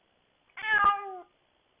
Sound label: cat meow